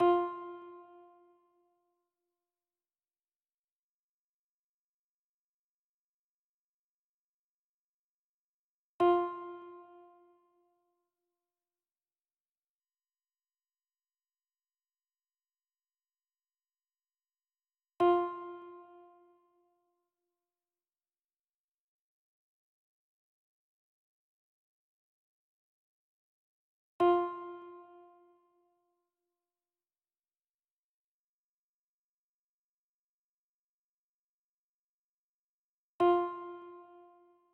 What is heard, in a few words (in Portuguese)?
amostra de pulsos com periodicidade